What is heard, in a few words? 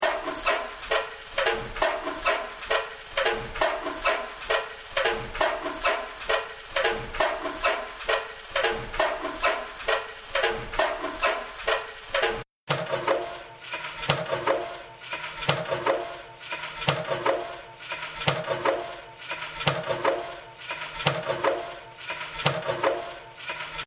Factory